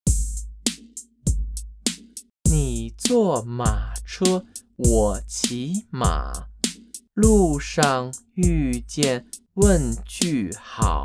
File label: Chant